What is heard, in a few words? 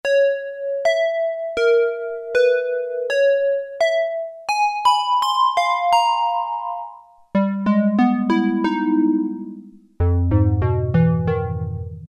Reed, Roland Juno-60; Factory presets